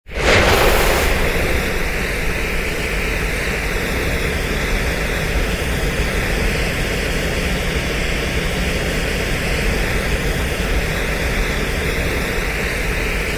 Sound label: ignite trail